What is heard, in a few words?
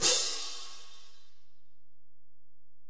Ride, Alesis DM-PRO